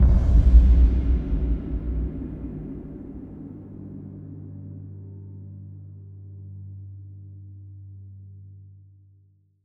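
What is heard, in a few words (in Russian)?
темный удар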